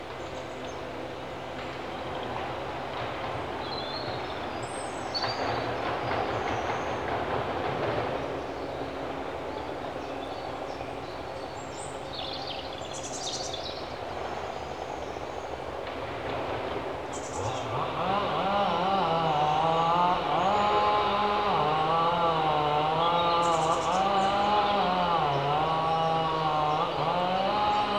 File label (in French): tronconneuse; rbi artoise aisne